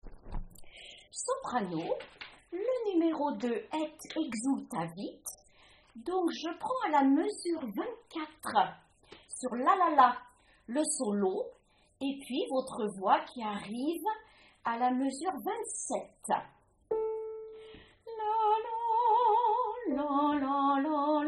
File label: Magnificat Soprano; Vivaldi